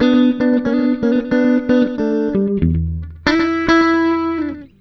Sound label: Blues, Electric & Acoustic Guitar Loops